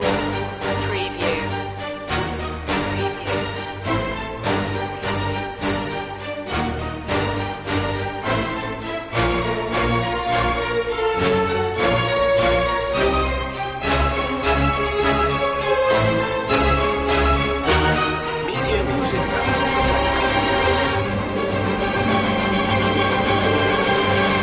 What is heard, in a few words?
fight for survival no choir no perc